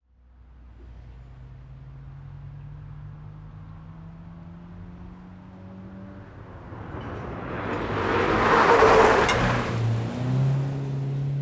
Drifting Around A Corner Pass

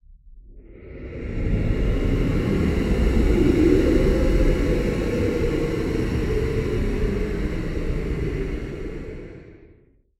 Horror Monster

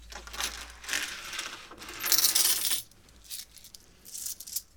Collecting Coins From Table

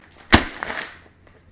Bag Drop

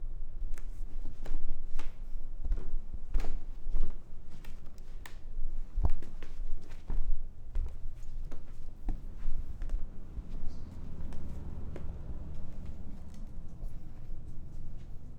Walking On Wood Floor

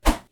Attack Punch

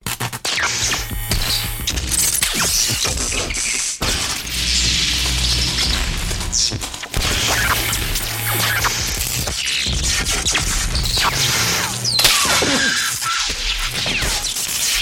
Sparks Explosion Big Long Explosion Sparks

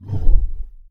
Whoosh Woow

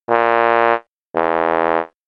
Fail

